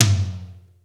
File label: high tom single hit g sharp key; Mono